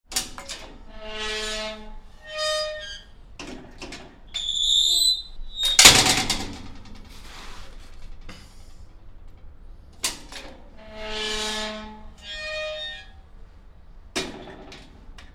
House Gate Metal with Squeaks, Open and Close various with latch; nice squeaking; Gate Sound Effects